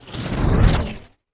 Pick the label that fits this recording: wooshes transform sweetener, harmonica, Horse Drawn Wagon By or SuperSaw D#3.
wooshes transform sweetener